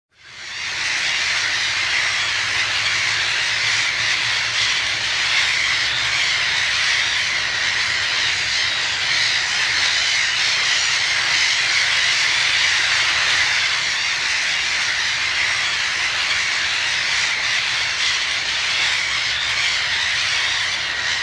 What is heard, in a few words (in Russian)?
ogromnoe skoplenie ozernyh chaek na svoih gnezdovjah